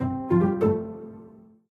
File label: Strings, notifications; Honor X8b